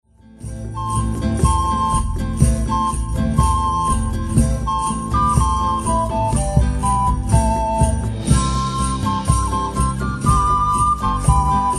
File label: Eagle Dance, NATIVE AM FLUTE